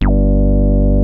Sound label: HITEC LOW G1, ANALOG BASS; Music Store - Claudius Diemeter Schwerlos